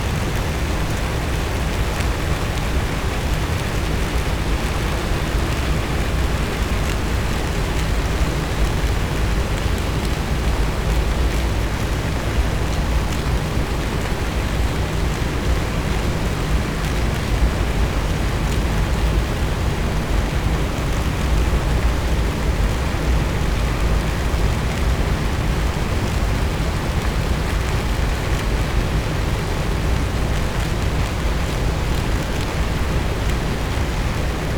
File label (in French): cachalot negatif, Physeter Macrocephalus